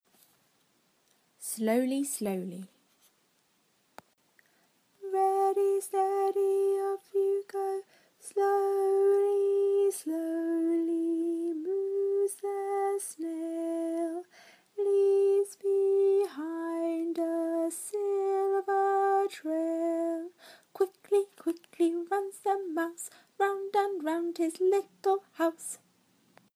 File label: song; eyfs and key stage 1